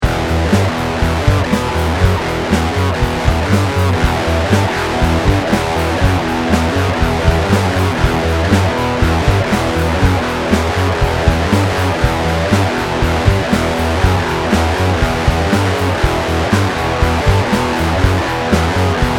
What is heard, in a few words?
Crash